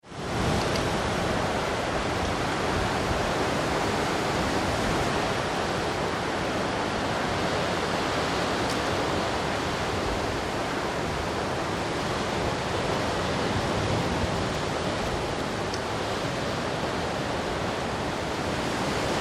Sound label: Wind Open and Blustery with some Foliage moving; Weather; Tom Hutchings